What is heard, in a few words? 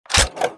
long mecha